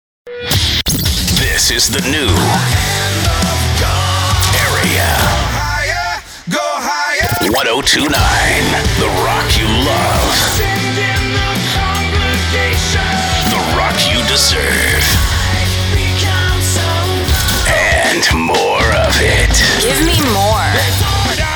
Custom Rock; Benztown

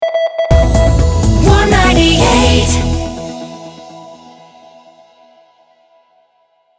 Traffic 124bpm C Stinger, jingle; Warm 98

